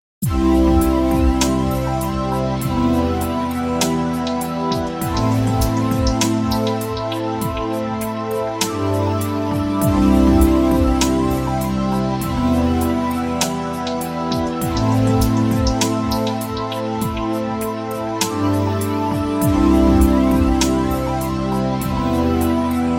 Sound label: SPIRALS